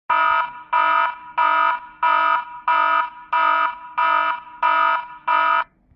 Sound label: alarm; qilu hospital